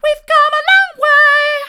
we've come along way mid, vocal

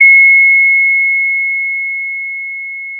pop, Sound of a school bell

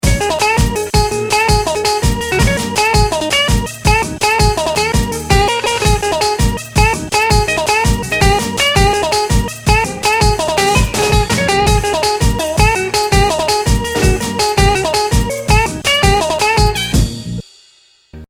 mix Drums

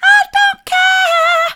i don't care soft, vocal

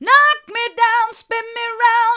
knock me down spin me round mid, vocal

